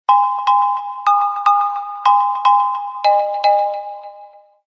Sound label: Sony Xperia XZ2 Air ringtone